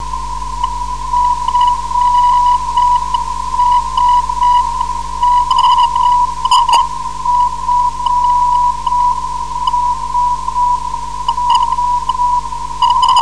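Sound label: non-directional beacon; fss; 510khz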